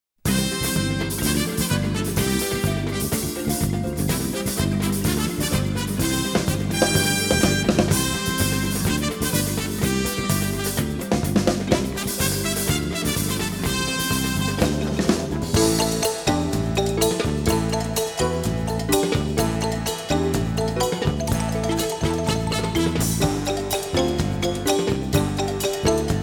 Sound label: Instrumental Score